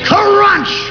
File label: crunch, cartoons